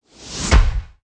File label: big hit